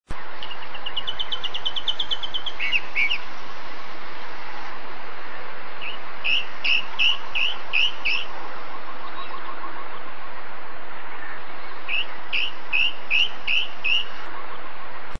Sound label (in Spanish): voz calandria grande